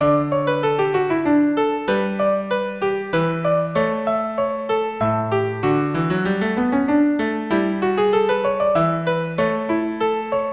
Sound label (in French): courante